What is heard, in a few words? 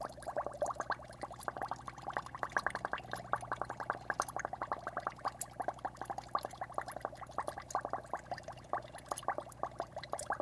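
Aquarium Small Air Filter, bubbles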